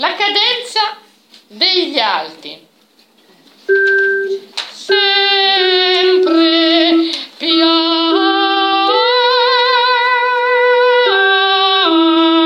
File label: cadenza alto